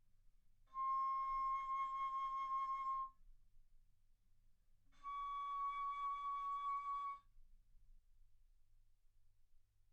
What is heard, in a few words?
Bass Flute ff C6 Db6